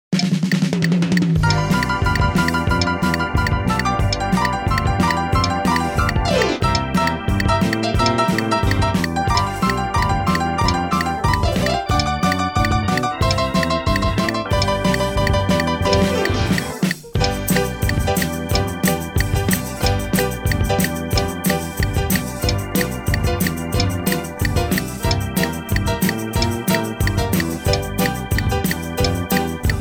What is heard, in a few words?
Click track